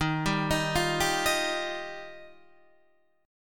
D sharp Major Add 9th D sharp Guitar DADGAD, chord; 13,10,13,10,10,13; chordshape